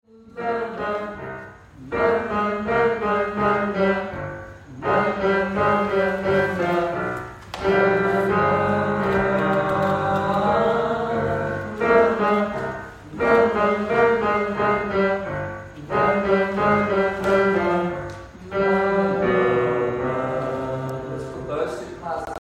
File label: Tenor